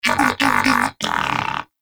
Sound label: Pet Tenacious Disagree; Pets; Warframe; Moa